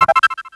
open chest

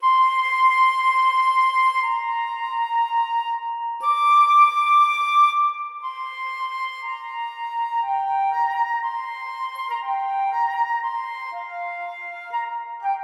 Flute; OpenWorld

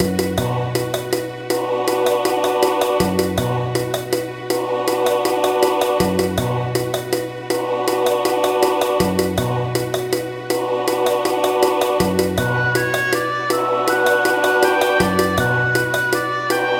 Arab Village

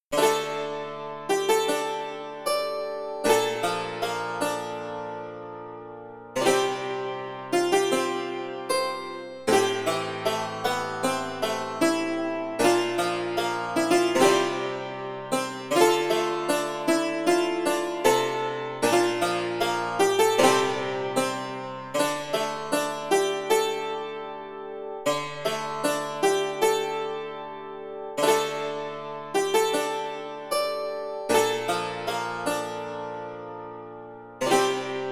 Dulcimer; QZProductions

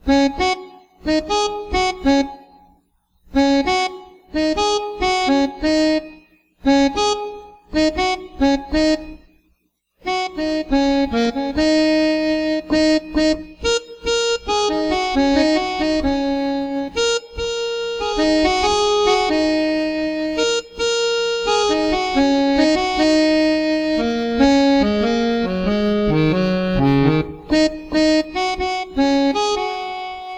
Harmonic dance

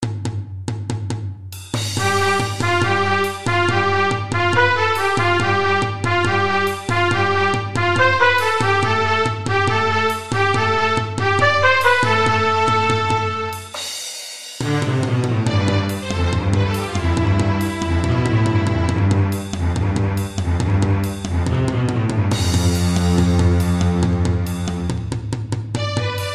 March Loop

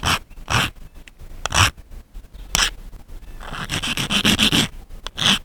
scratching stones on a beton

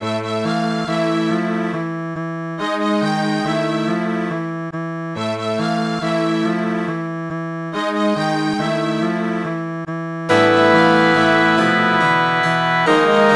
witch castle midi sound

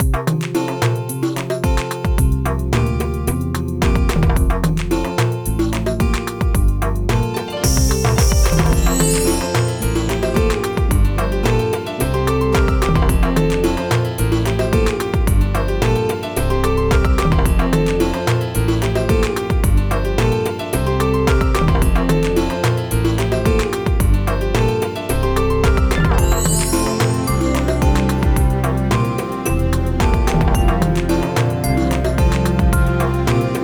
World Music Far Away Groove

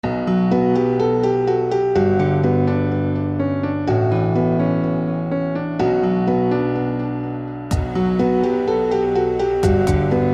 piano track

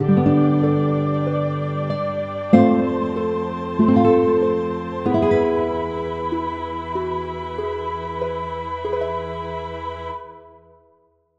piano

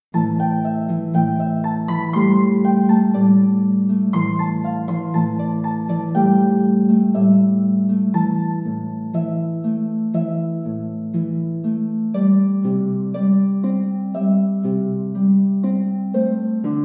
Open World Harp

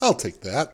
piano Loot Collection

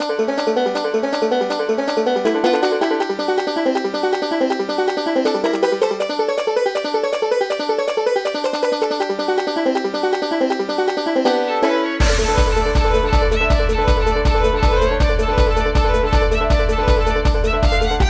Banjo Utopia

